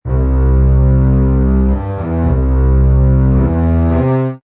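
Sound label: Loop Orchestral Bass C 110, Orchestral bass loop C Major 110 bpm.; String Loops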